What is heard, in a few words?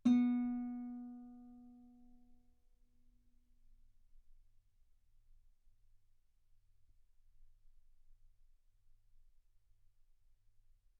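Guitar ff B string B3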